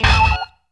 BIG BAD STAB; Zero-G; Funky Elements

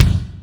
SR16 Kick, Alesis Sr16; Drums

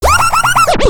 backscratch, scratches; Zero-G; Freak Beats

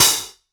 Solid Bruiser Open, Hats; Airwindows

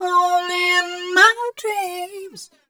f mel c, fem Vocals

